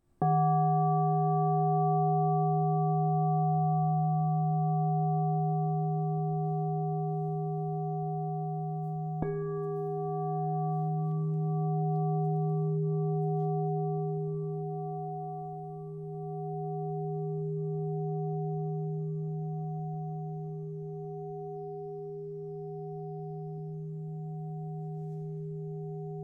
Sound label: Crystal Tones Singing Bowls